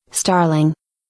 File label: starling, wild birds